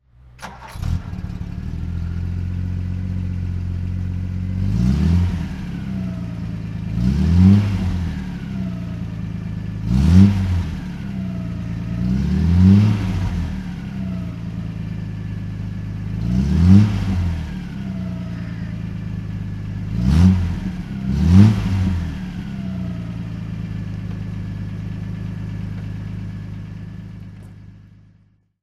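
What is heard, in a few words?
alvis te21 dhc throttle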